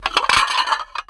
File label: Plate Underlay Attack